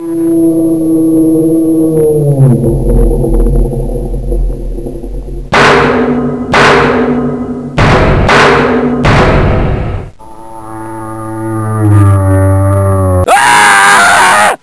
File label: scene end, ambience; unholy